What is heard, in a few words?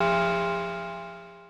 synth poly c2